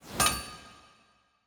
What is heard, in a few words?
ambience room forge pickaxe ready